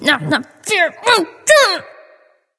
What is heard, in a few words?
grumble; smite; artio